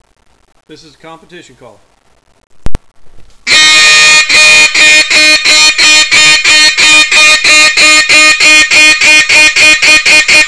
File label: competition call